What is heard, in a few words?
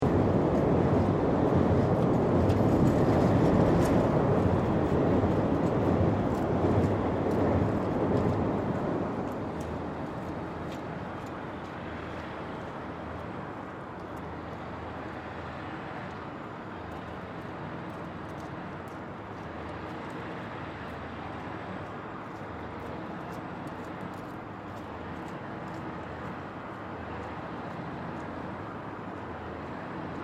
Traffic, Busy traffic center in Stockholm, Sweden; Slussen; occasional trains pass by, well defined train or subway sound